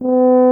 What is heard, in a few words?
tuba; Miroslav Vitous - Solo Instruments VOL-2